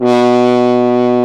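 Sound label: french horn, orchestral ensemble; F.Horn 1&2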